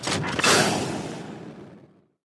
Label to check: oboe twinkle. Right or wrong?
Wrong